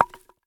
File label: Log Dirt Hit